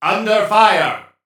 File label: Taking Damage; Realm Royale; Warrior; Alpha1